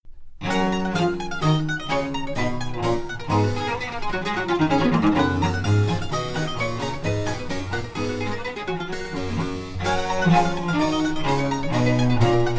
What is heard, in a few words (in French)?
SONATA Sol Majeur Allegro Finale